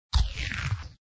Die Bullet; Transmorpher 2

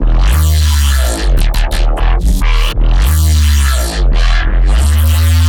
Bass Reese Neuro 175 D#

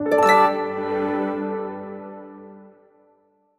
Town Rank Up; Mission

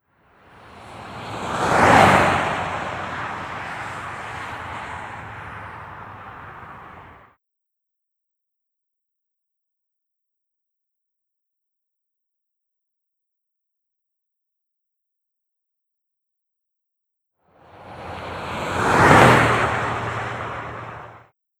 Cars; Arche

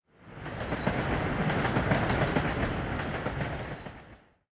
Ambience Train Passing; Subway Surfers City; Android, iOS

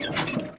Land Soft; Test Drive Off-Road 3

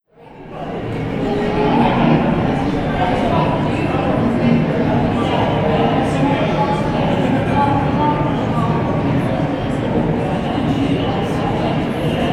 nyc Walla; Cities

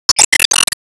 Enemy Glassy Common Delete

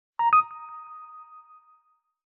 Arcade Logo

